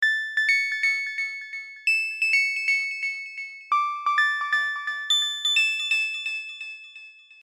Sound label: 130bpm dx10